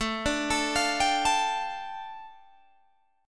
A 7th Suspended 4th Sharp 5th, A, down, Guitar, Open G